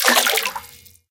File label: water, footsteps